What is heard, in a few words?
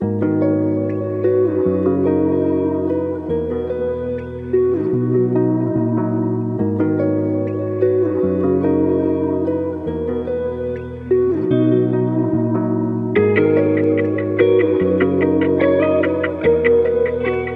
146 B, chill electric guitar type beat